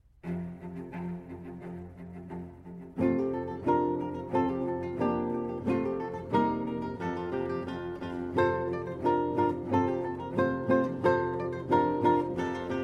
Capriol Suite for Strings, Mattachins, Sword Dance, Allegro con brio; Warlock